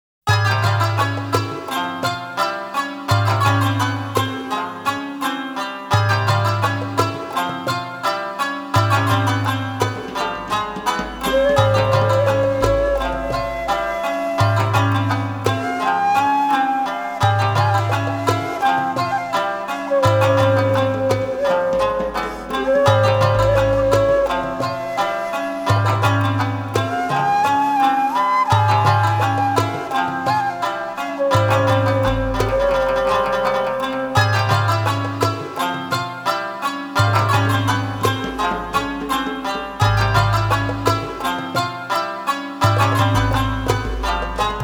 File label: Taiko no Denshou; Fate Stay Night Soundtrack Collection; Fate-Stay Night Animation Original Soundtrack